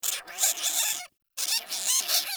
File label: Day Close Critters; Ambience; One Offs; Glades Of Andurin